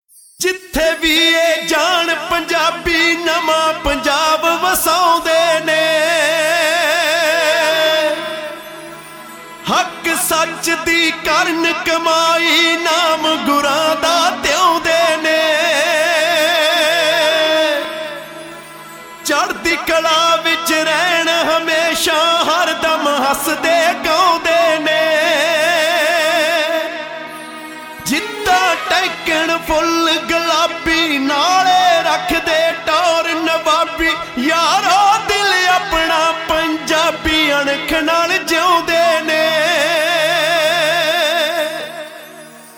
Punjabi Theme

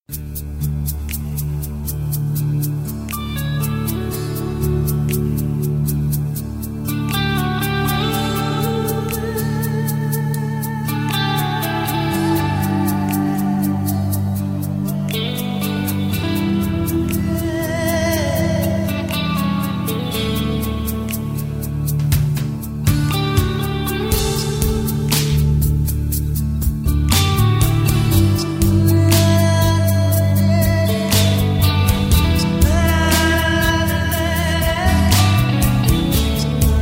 Instrumental

